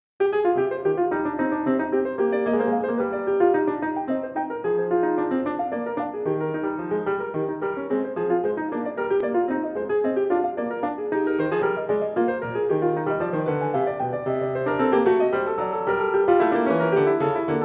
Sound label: Courante; No.2 in C minor, BWV 813 - II.